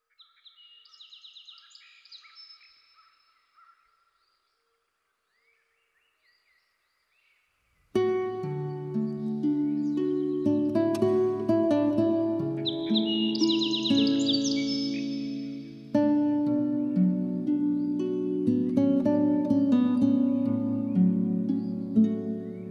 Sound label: Songbirds; Dan Gibson